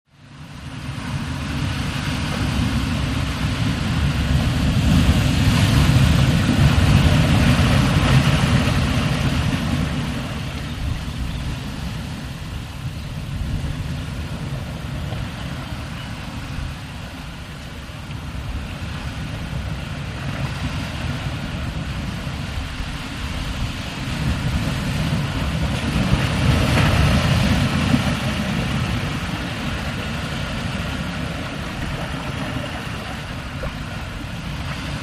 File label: Steady Heavy Surf, waves & surf